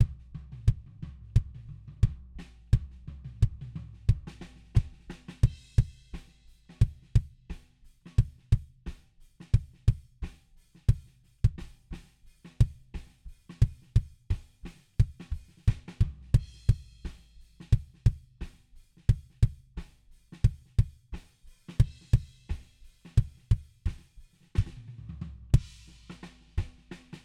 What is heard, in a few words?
EQ Kick